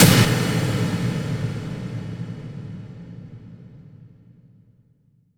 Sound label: Hits & Explode